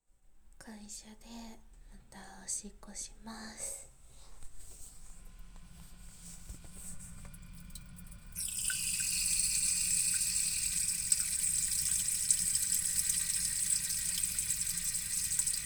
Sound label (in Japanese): 会社のトイレでオシッコ; １日目